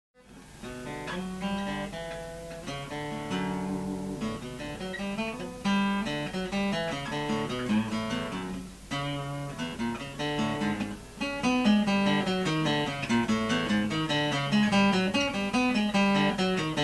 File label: Instr ac gtr